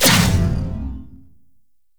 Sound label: electricity hazard pulse; Gameplay; Entrati; Vaults